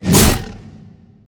Monster; Zenless Zone Zero

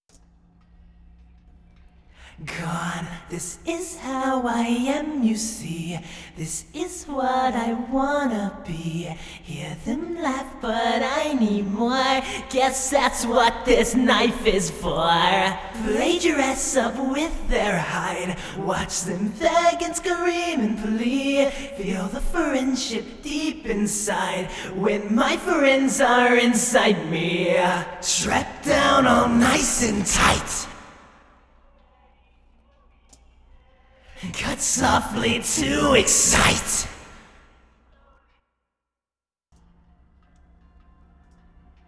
Verse 3 Vocal

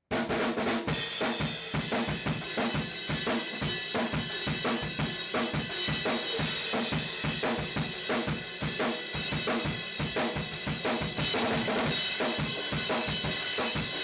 distorted drums

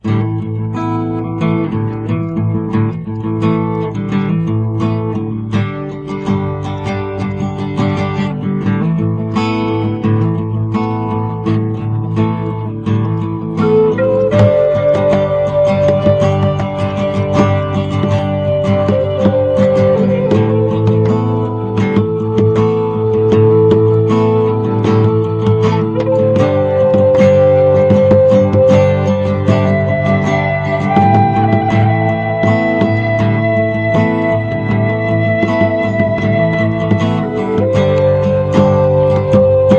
native country

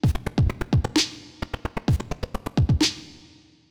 drum; Pony Fan Music Remix Stems

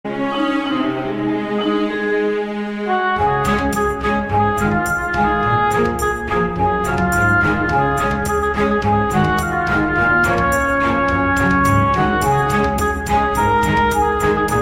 Orchestral Remix

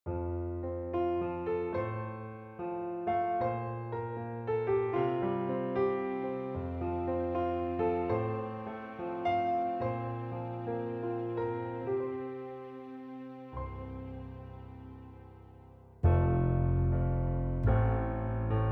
Christmas Medley, Instrumental

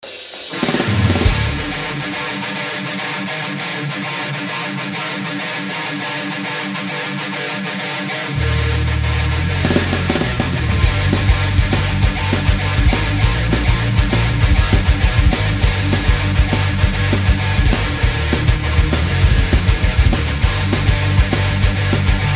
desert wolves thrash metal

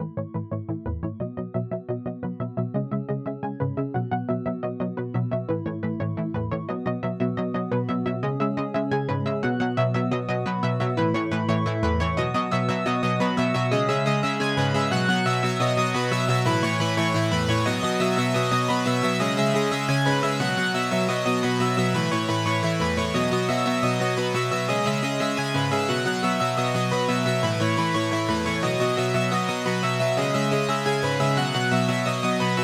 pluck, 175BPM; Pony Fan Music Remix Stems

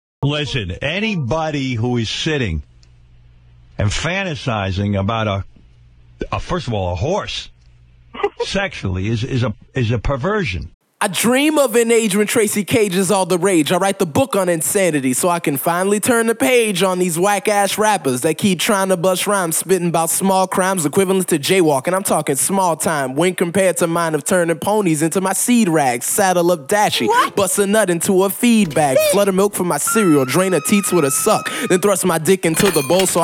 vocals only with samples 80BPM; Acapellas; Pony Music Archive 19.03

